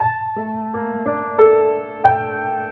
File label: piano, Loops Sad